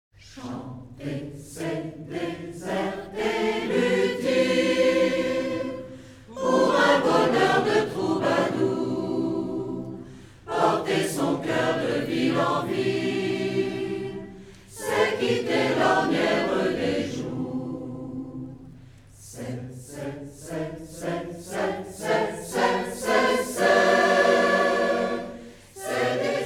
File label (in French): chant